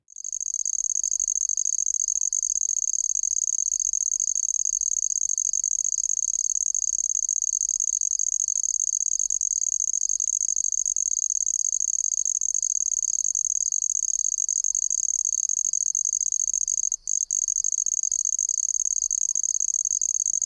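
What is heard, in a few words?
handsome trig, Northern Illinois Insect Calls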